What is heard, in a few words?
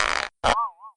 tug